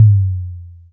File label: large room tom one shot f sharp key, Royality free tom tuned to the F# note.; tom drum samples; one shots